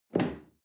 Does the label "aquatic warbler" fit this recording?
No